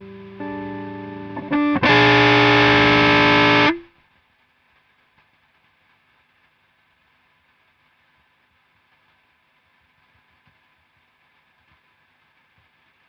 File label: guitar and effects, spluttery noise on audio interface inputs